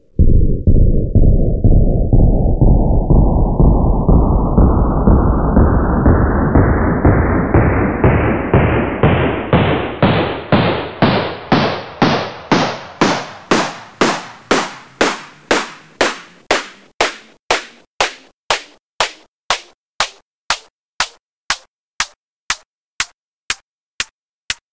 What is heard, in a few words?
gun shot tonleiter